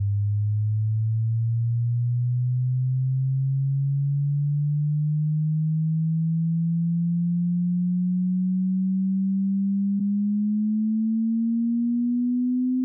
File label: sweep 192kHz